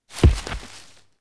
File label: Med step, Dino